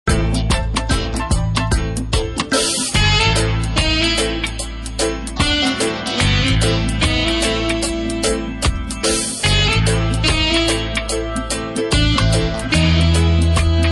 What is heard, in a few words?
Reggae